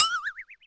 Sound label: miss; HeavenStudio; frogHop